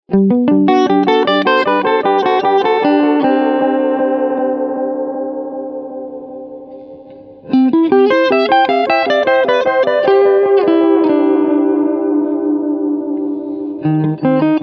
Medium Delay Mod

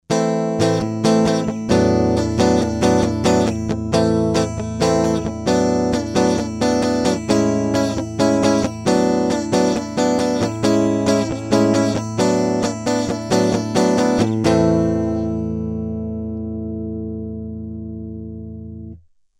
Audient id44 mkII gtr

